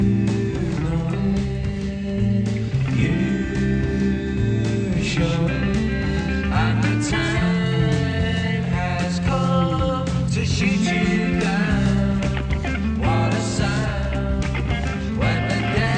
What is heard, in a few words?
rock